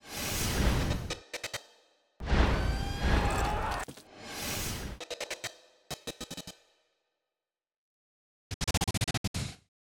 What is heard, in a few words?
SFX; NewsOneNow